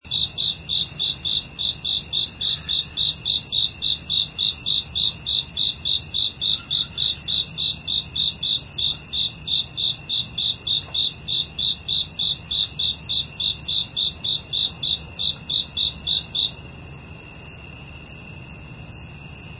Crickets and Insects, Insect sounds; Animals

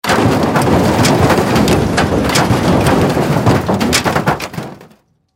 Crash Metal Large Debris Roll Spill Fall; Crash Metal Large Debris Movement Roll Spill Fall; Blastwave

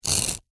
Crunch Rip Tear, Floral, Foam; Texture; Crunches; Objects; BlastWave

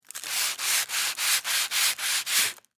Sand Paper Wood; Hand Tools (Sound Effects:Tools); BlastWave

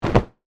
Body Fall Mat, Gymnastics, Hard; Sports; BlastWave

